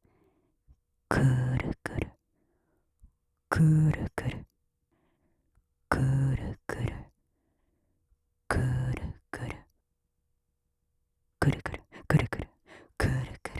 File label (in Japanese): クルクルランダム; ASMR; オノマトペ; 上官